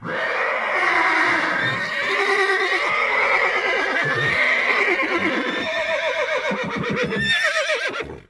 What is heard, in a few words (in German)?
Pferd